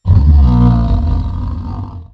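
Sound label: scream; The Elder Scrolls III - Morrowind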